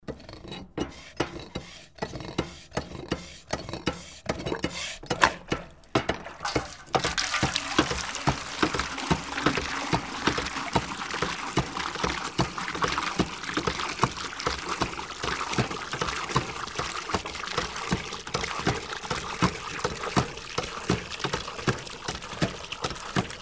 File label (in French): Pompe a bras